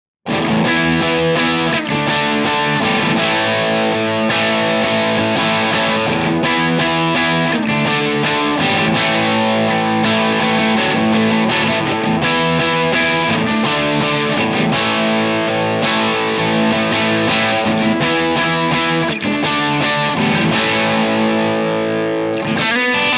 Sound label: crunch; jensentone